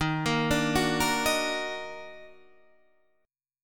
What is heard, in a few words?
Eb Minor Major 7th Eb down, chord; EbmM7; arpeggiated; JGuitar